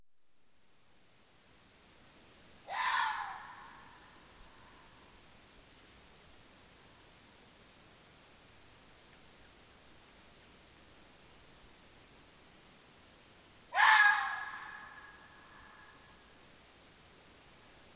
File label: Red Fox calls; LINGSHAN